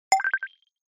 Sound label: Accent